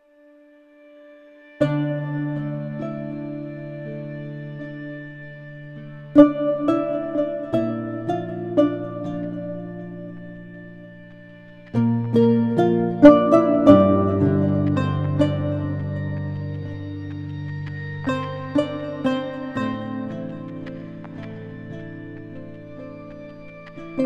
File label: lute